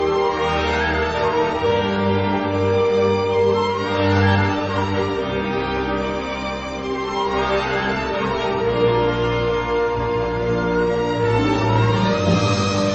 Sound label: Orchestral